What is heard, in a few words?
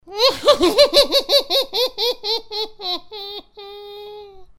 Evil Witch Laughing